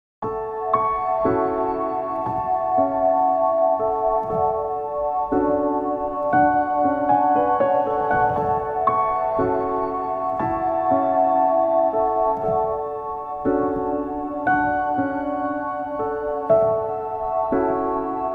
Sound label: Piano